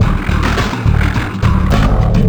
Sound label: Heavy Dirt; Sound FX